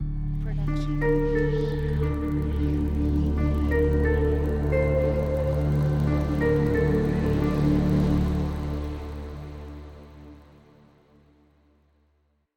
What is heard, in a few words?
Mystery Harp; soundscrate